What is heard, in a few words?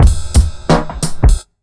BEAT, Underground Hip Hop